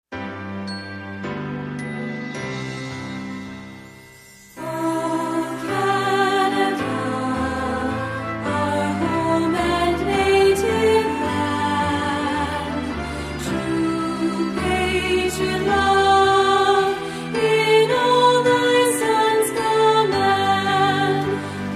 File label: o canada canadas national anthem lyrics and vocals